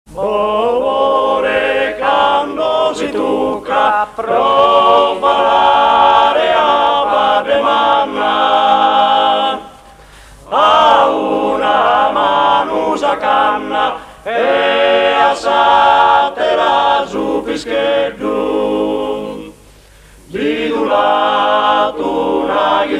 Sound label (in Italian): canto a tenore; archivio rai sardegna; musica strumentale